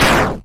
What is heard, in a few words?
dagger hit; shiv; deltarune flowery over shiv abilities